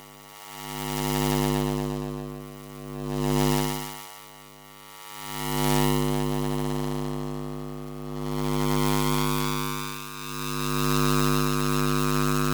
Electric, Pass-by, distorsion, motor, turning machine, accelerate, increase, decrease; Sound Sower; Electric Field